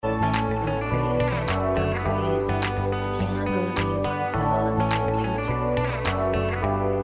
B Vox

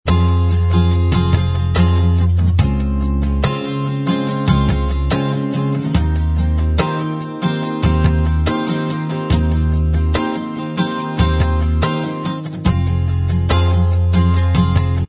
acoustic Inst Track

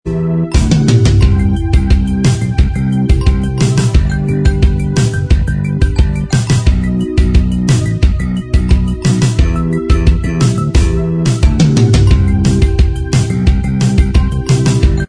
Inst

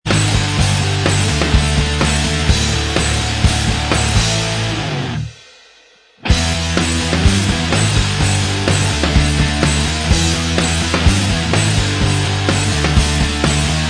Inst Track

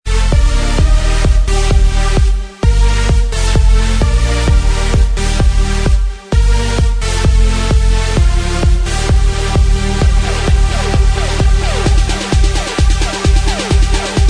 Inst

